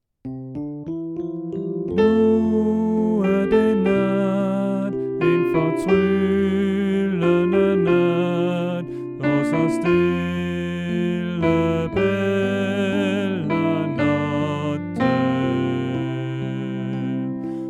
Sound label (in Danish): Sopran